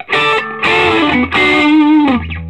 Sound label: SOLO, Vintage Blues Guitar